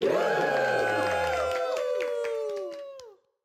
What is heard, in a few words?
audience woos with claps